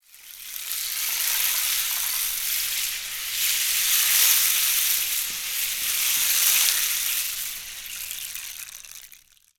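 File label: rain stick; Spectrasonics; Heart of Africa 1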